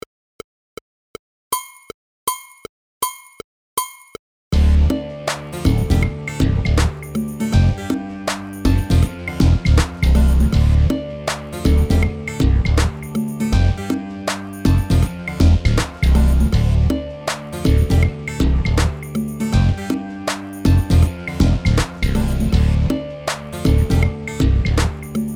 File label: half time shuffle groove loop